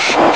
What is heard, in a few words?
wind; Amiga Soundtracker Sample Packs